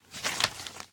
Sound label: paper